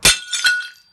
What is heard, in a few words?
vase break